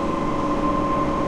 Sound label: Motor, Train; OpenBVE